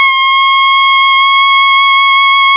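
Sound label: ALTO pianissimo D 5, Sax; Giga Samples Collection; ALTO SAX PP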